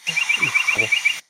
Asian Paradise flycatcher calls, Kubah National Park Sarawak Borneo